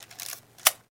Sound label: 35mm Camera Wind